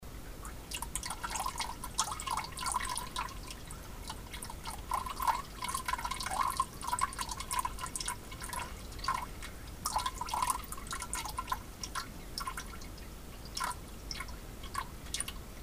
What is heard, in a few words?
Some Later Dribbles, Plumbing